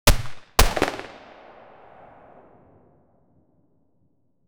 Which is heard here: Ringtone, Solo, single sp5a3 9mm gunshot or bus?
single sp5a3 9mm gunshot